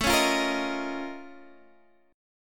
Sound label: Bb Minor Major 11th A